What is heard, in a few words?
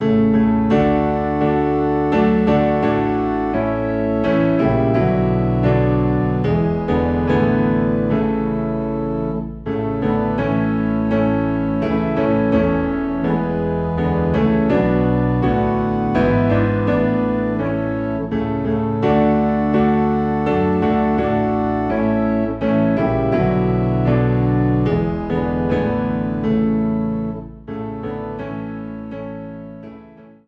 Piano and Organ Low in A; Children of the Heavenly Father